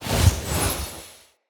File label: Staff Caster Charge Heavy Start Long, Weapons; Player; Staves; Galene